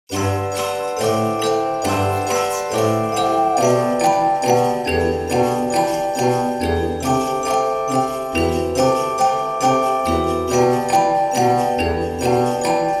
Holiday Night Owl clochettes de noel; feerique onirique

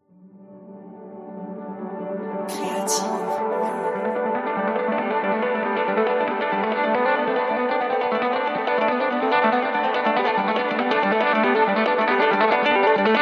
Racing the Sunset electronic

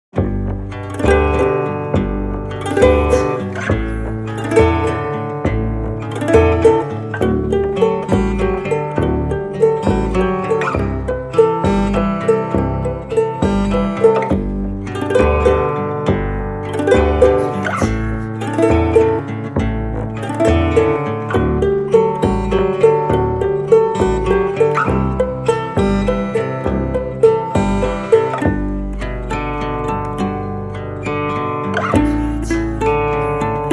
contrebasse

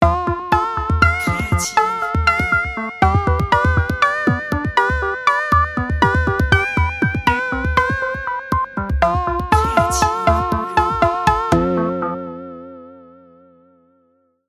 Stage Complete vintage

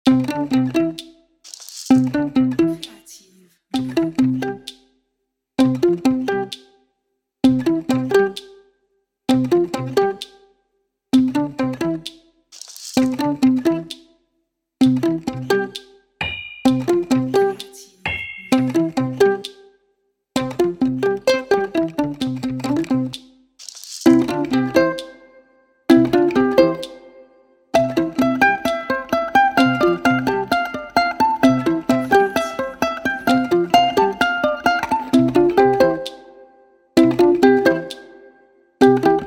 Merry Mountain ukulele

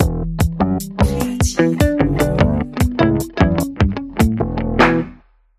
electronic

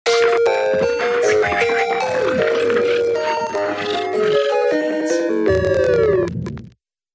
Crazy Electronic Frog

